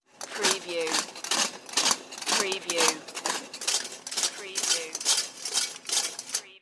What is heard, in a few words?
Food Mill; General sound effects heard in bars and restaurants from glasses clinking, mixing drinks